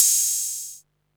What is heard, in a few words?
hat, Techno 138 BPM